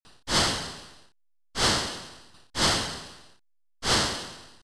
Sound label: missile